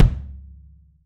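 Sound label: wood kick, right; 3D Drums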